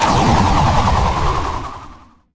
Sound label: Fan Of Knives; war3source